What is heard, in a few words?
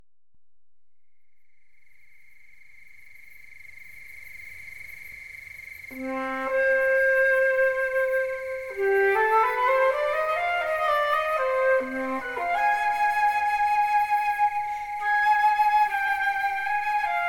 Suite in C Minor, Prelude; Suite No. 5; BWV 1011